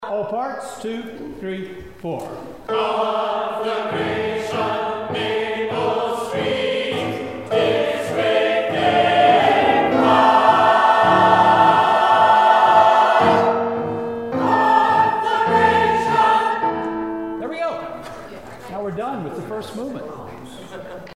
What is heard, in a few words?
SATB; rehearsal files